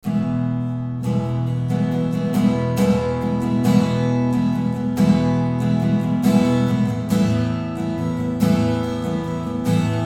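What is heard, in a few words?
short reverb time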